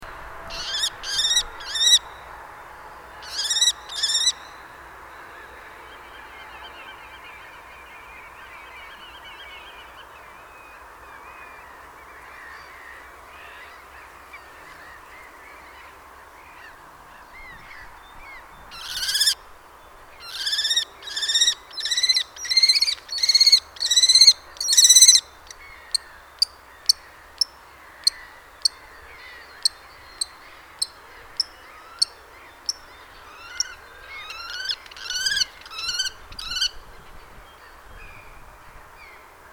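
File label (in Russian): obyknovennaya pustelga golos, common kestrel voice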